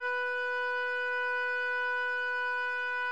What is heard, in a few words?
instrument note